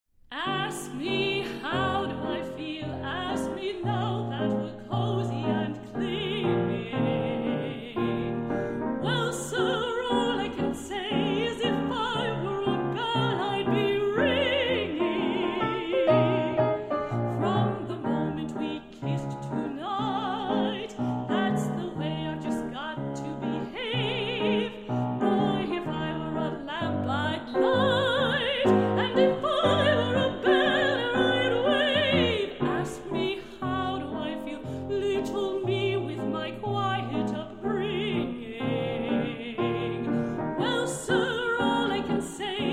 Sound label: bell